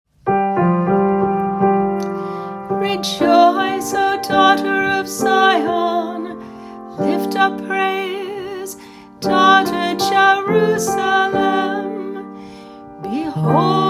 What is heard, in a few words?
Communion Antiphon; Dawn Christmas